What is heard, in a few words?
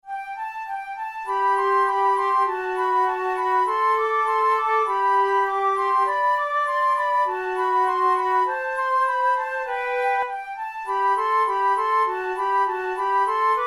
duets, flute; kohler; forty progressive duets vol1 no9